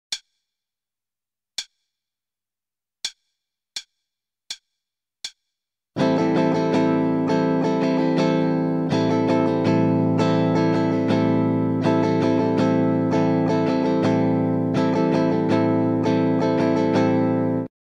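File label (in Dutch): Ritme, gitaarstarter; Meespeel CD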